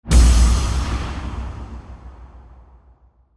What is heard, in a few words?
explosion